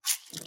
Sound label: blood squish